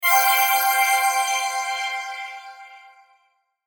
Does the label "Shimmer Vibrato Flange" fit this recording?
Yes